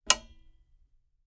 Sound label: Household Lamp Switch